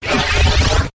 Hollow Tube Garble, Taylor Grand

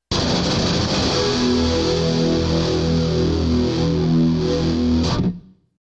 Solo Guitar Riff; Solo Guitar Riff 2

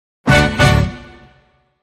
Hit

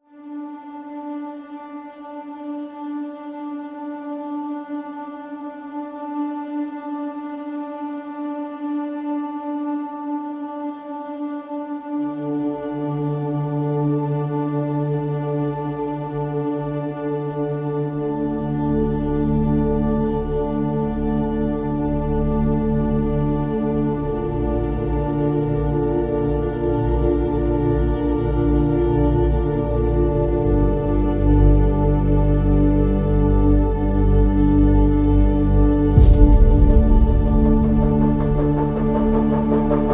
No Keys

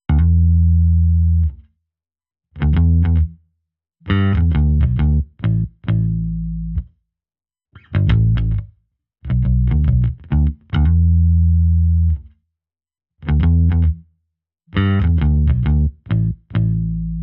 Dekota, Bass

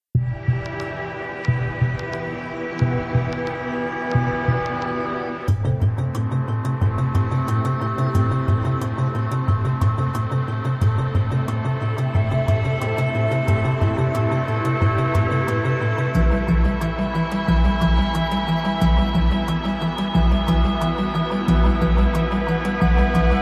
No Piano

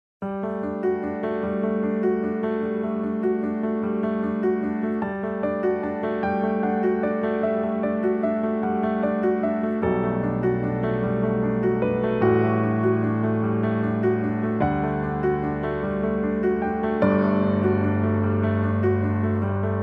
Piano Only

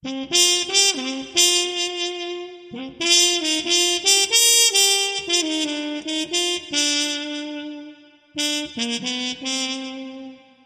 Muted Trumpet

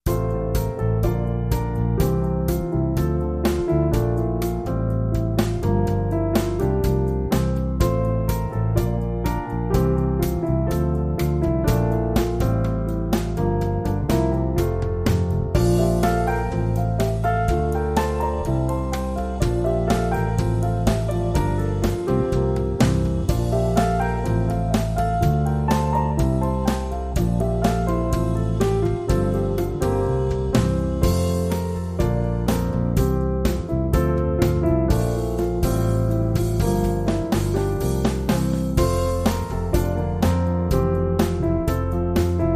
Fountain Coin With Trio

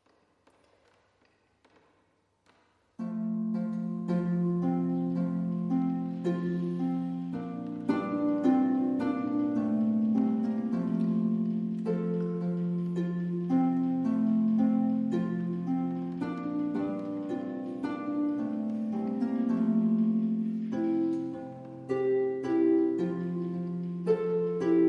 Harp Deck The Halls

